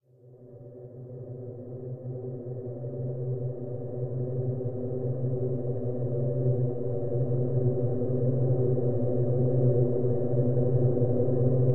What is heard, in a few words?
Small ambient dark; STALZONE